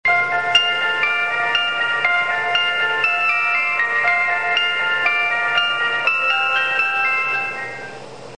kantobus, chime; 関東自動車; 高速バスのチャイムです。静鉄電車の接近メロディーのロングバージョンです。

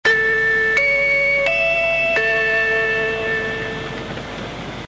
chime, train; 東海道・山陽新幹線; ひかり・こだま（途中駅用）; ２００３年１１月２３日まで使用されていたチャイムです。; この頃は車両に関係なく「のぞみ」と「ひかり」「こだま」で使い分けられてました。

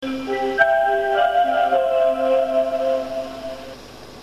kanto bus, chime; 関東バス; 夜行高速バスのチャイムです。; 基本的にチャイム１が流れますが、消灯前の案内放送ではチャイム２、おはよう放送ではチャイム３が流れます。

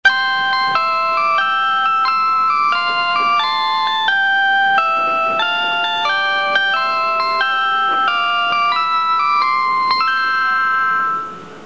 train chime; スーパーひたち; ６５１系のチャイムです。; 鉄道唱歌; 登場当初はオルゴール式の鉄道唱歌でしたが交換されました。

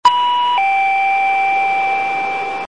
limousine bus, chime; チャイム１ − 通常放送; 車内チャイムは２打音; 東京空港交通; “リムジンバス”の元祖ともいえ、羽田空港と成田空港を基点に関東各地を結んでいます。

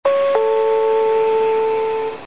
limousine bus, chime; チャイム２ − 啓蒙放送など; 車内チャイムは２打音; 東京空港交通; “リムジンバス”の元祖ともいえ、羽田空港と成田空港を基点に関東各地を結んでいます。

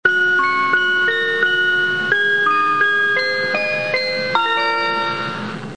train chime; 雷鳥; チャイム２; ４８５系のチャイムです。; ２００８年春頃から電子音チャイムへ交換されています。

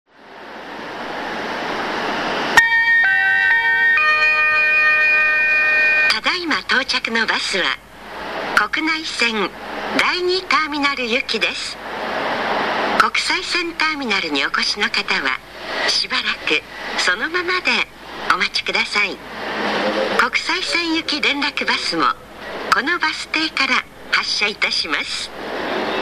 haneda airport, bus stop; 羽田空港ターミナル間無料連絡バスのりば到着放送 (1); 羽田空港国内線ターミナルのターミナル間無料連絡バスのりばでは自動放送が導入されており、到着時には空港を意識した(?)４打音チャイムが流れます（第１ターミナルで収録）。

